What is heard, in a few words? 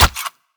Queen Attack Hit